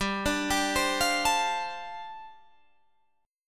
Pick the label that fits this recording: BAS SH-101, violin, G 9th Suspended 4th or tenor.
G 9th Suspended 4th